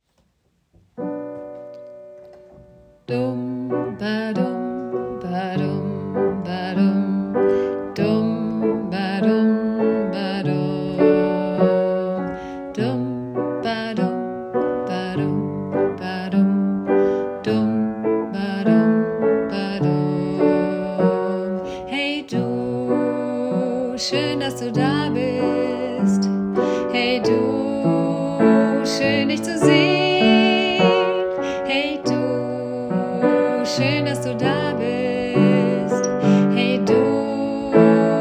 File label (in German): Lasst uns feiern